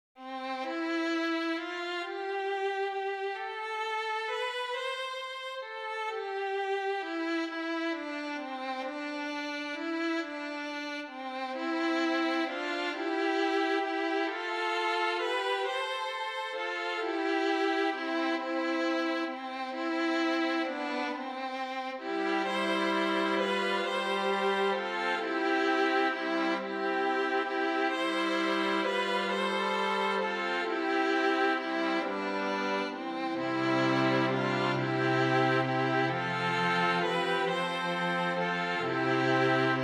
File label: INSTRUMENTAL; Sweet Hour of Prayer